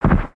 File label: Footstep; Burno; aronwk collection; ndaudio; creatures organic